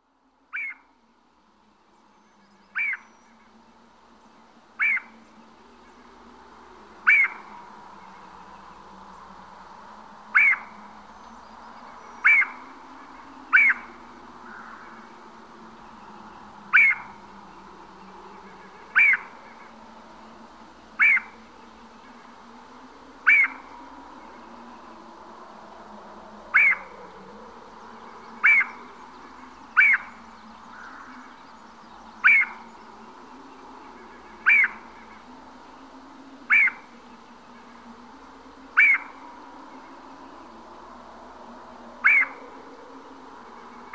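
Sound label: sounds of woodpecker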